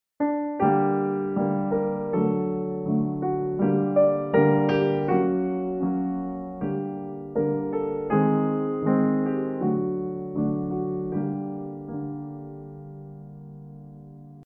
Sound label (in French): theme piano solo